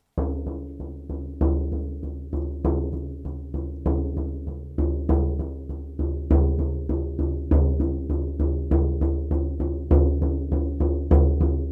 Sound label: Reindeer Arctic Song, drum